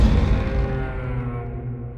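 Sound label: Music Volcano 120; from dust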